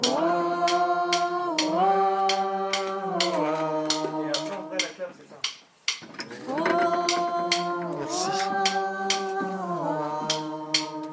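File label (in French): Alto Thème Recrée, brassband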